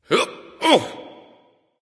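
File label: nonverbal grunt lift; smite; voicepack; ullr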